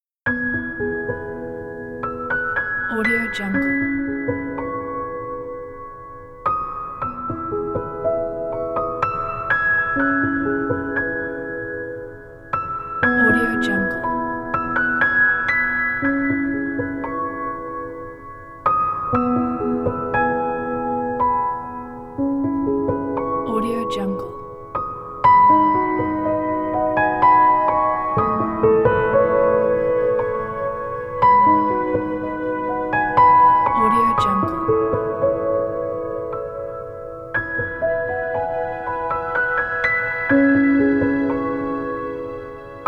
tender romantic piano

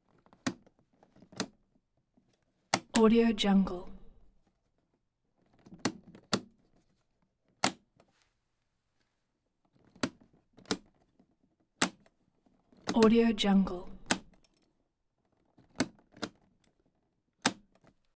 magnavox camcorder case latches; collanderson

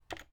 keyboard typing

